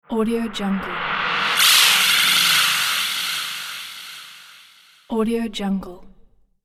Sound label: paranormal mystery element